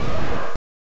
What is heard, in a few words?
Boss Howl; LOZ